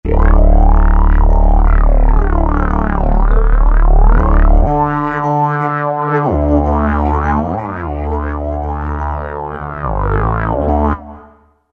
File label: Acidoo, Roland V-Synth; Factory Patches